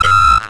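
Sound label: droid probe hit; Star Wars Knights Of The Old Republic I; XBOX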